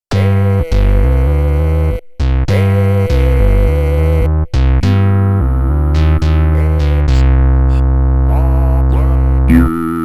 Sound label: Voice Kit, Korg Radias-R